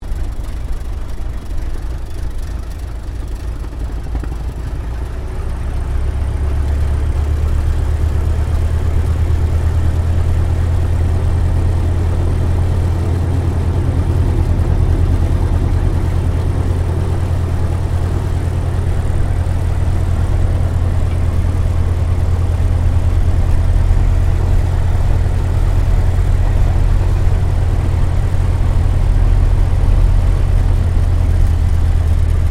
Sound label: AIRCRAFT T-28D TROJAN TAXI INTERIOR; Airplanes; Vehicles